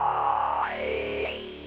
ambient; Soundengine - CSR-000 The Art of Transwaves; MOVING PART

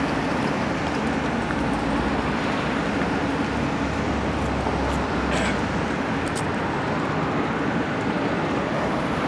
CITY

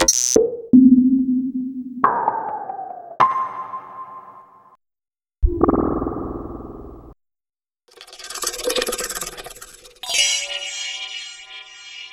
perc oneshots; Sliced; FutureMusic

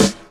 SD, snares; EastWest; Dance Mega Hip Hop Electro

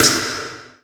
808 Snare, Kit; Sonic Foundry (Sony Creative Software); Esoterik Beatz

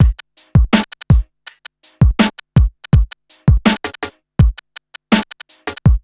BEAT, Burning Grunge Hip Hop

